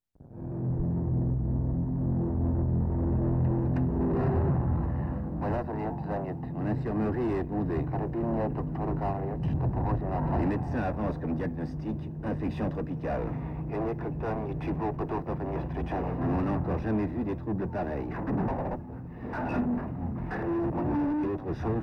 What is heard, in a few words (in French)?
Punk Triso Trash intro